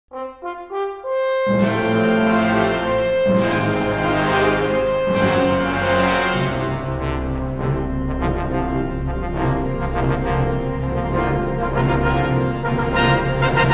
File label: Chorale With Orchestra, Victor's Hymn